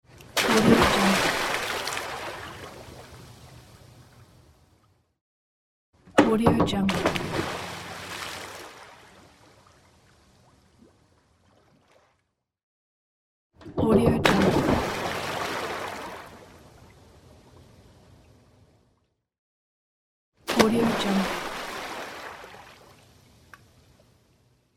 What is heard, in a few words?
dive into swimming pool